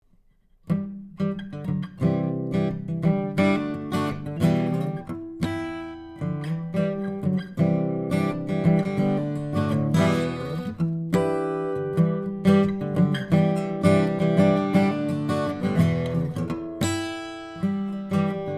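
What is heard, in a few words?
Harmonics, vintage AKG C414 COMB condenser; SANTA CRUZ OMPW; CARDIOID; straight signal with no additional EQ or effects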